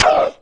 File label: PTUUUHH, VOICE; Rarefaction - Poke in The Ear With A Sharp Stick